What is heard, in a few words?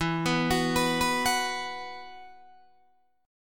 E Suspended 2nd E, Guitar, DADGAD; down